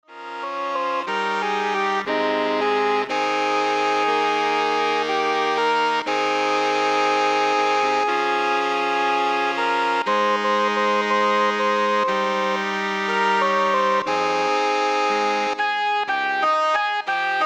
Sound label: Saxophone Ensemble